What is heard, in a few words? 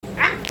ahhr